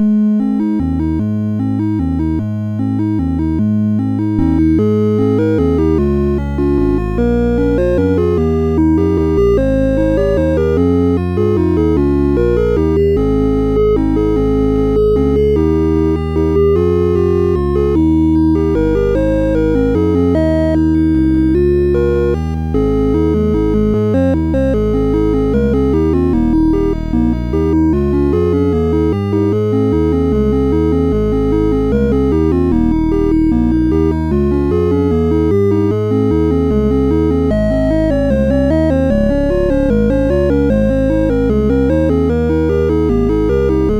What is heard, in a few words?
Computer Christmas Card sid MOS6581R4; DEMOS